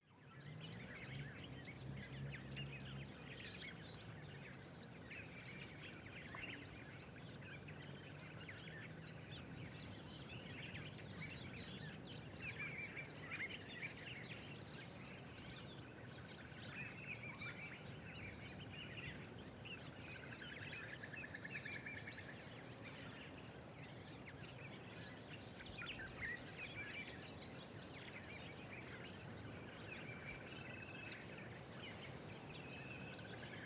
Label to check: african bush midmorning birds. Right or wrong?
Right